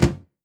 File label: short tom one shot c key